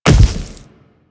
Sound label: Infested Splitter Step Default, footsteps; Warframe; Grey Strain